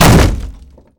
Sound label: Wood Barrier; Totaled; XBOX